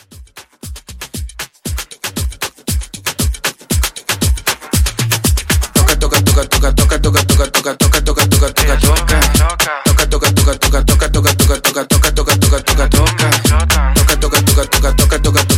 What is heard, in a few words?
Latin Club; Latin Club Edit